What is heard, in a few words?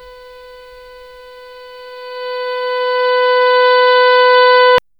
continuous futuristic